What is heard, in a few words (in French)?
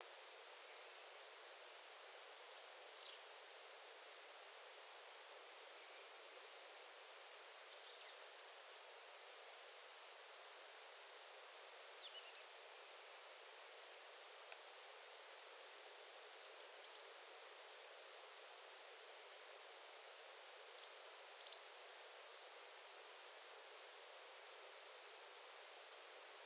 Roitelet huppé; Issaux